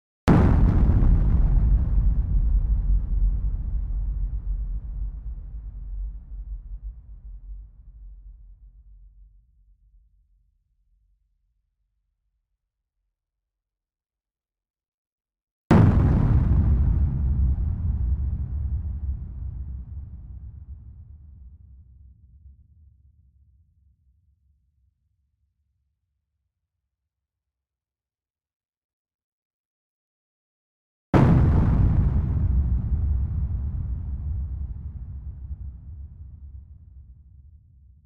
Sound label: Clean Explosions